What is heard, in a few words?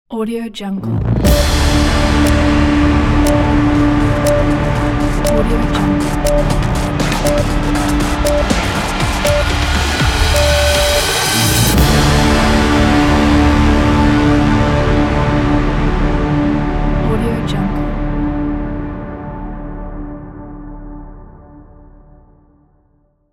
epic countdown opener logo; audio coin